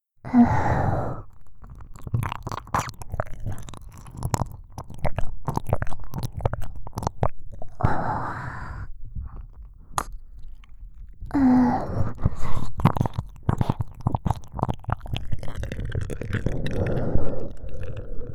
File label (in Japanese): 極深耳舐め×ねっとり触手